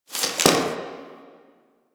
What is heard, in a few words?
Box Of Trash Rustle; Warframe; Ambience; Nineteen Ninety Nine